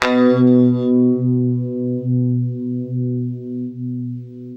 UNIVIB B 1, Guitar; East West; Steve Stevens Guitar Samples Collection

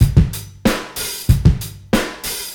Zap beat 93bpm, Hip-Hop Street Beats; Sonic Foundry (Sony Creative Software); Hip-Hop Street Beats Construction Kit; Street Beats by Poogie Bell

